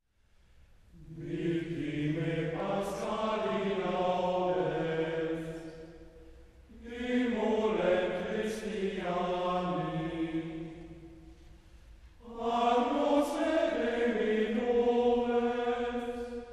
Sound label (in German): Choralschola Victimae paschali laudes; Kirchenmusik